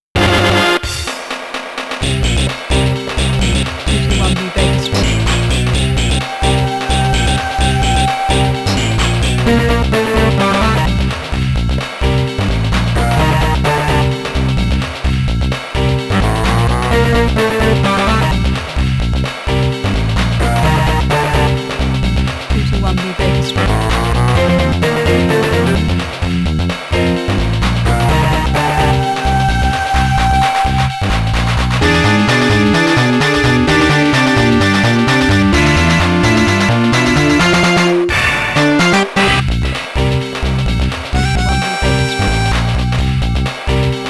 Ground Creeping Bass; the king of fighters 98 ultimate match

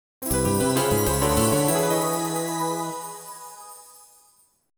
Logo (Sega Dreamcast Version); Capcom

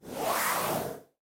activate slide up mono; Dokobots

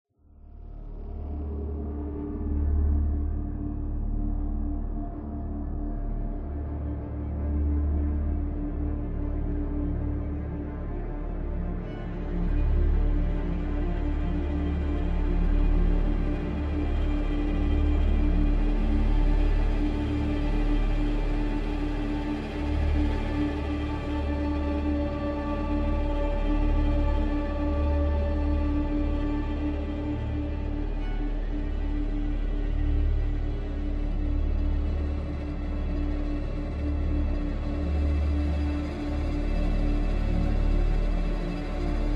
Tension; Inner Chains

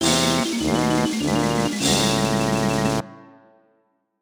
Failure; Banjo-Kazooie

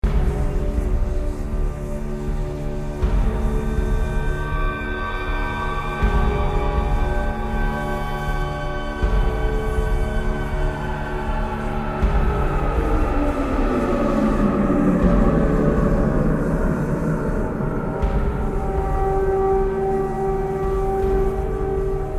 atmospheric; quake 4

